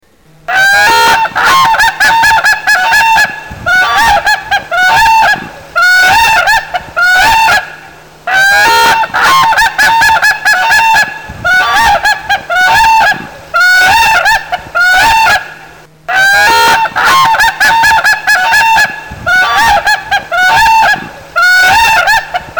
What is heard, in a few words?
Whooping Crane; Operation Migration